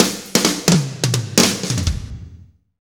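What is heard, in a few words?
slow shuffle, Roland drum beats; Northstar - Drumscapes Roland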